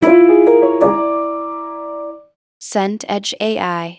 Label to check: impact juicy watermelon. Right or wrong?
Wrong